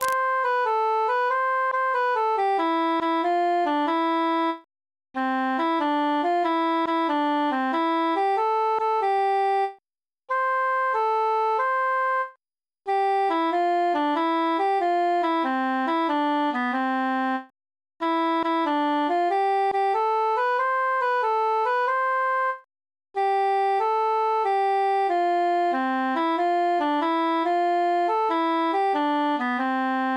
melody emphasized; sumer is icumen in

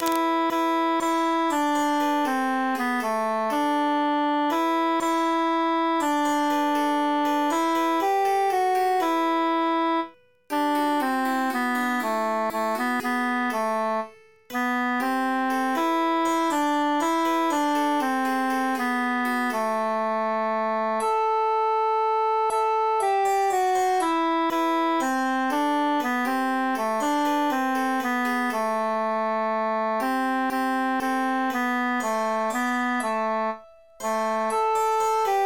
transposed tenor emphasized; la bionda trecca

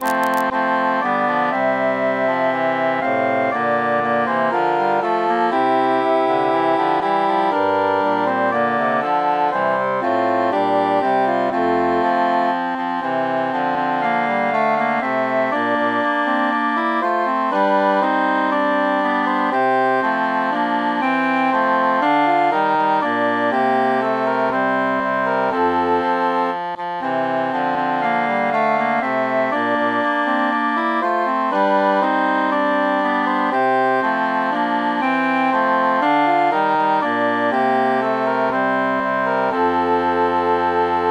quintus mute; silver swan

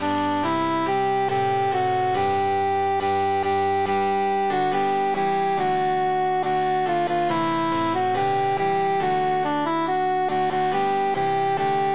altus emphasized; now is the month of maying

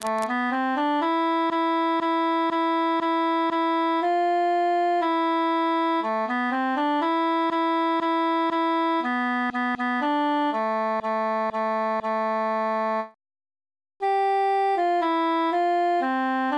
altus solo; il est bel et bon

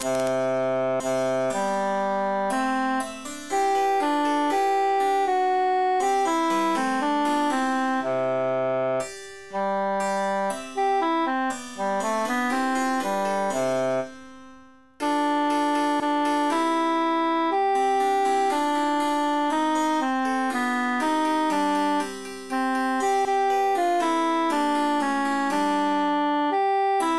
contratenor emphasized; se la face

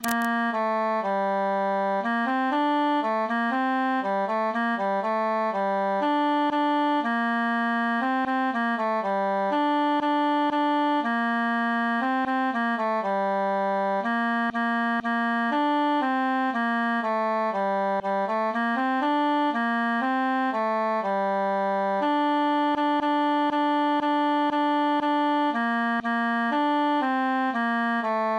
tenor solo; la la la

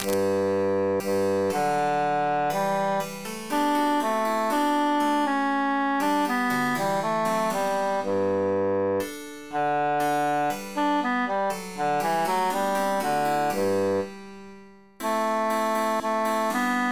transposed contratenor emphasized; se la face

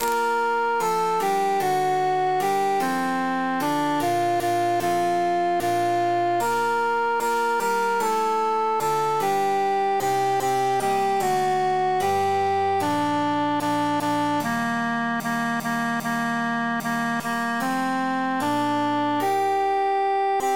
altus emphasized; fa una canzona

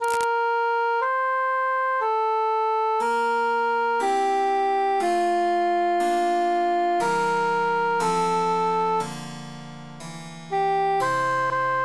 cantus emphasized

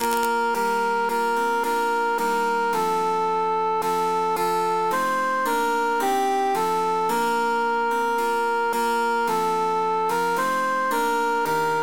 cantus emphasized; this evenfall tis snowing

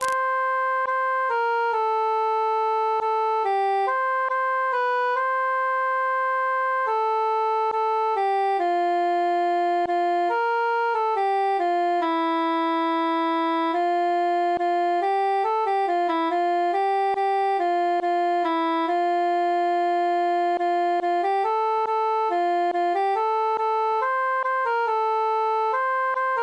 cantus solo; tant que vivray

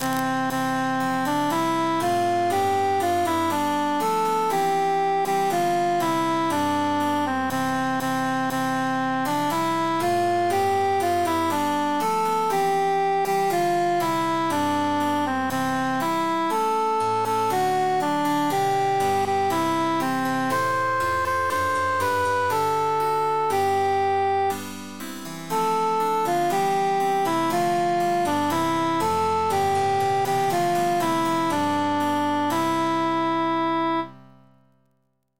cantus emphasized; since first i saw

